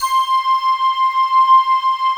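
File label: SQ1 metal, Synthesizers, synbrass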